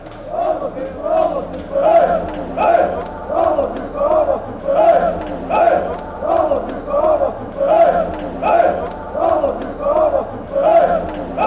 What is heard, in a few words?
hey hey lokeren fanchants